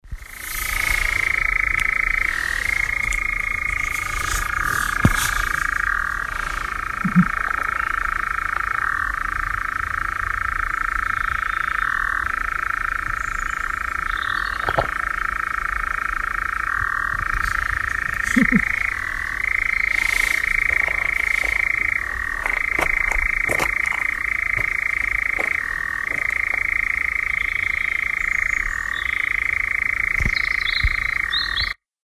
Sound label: Nightjar